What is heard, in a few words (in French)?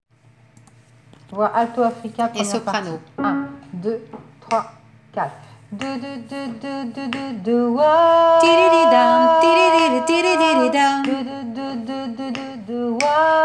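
soprano première partie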